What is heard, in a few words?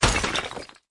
stone destroy